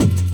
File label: kick; Breaks N Beats Sound Kit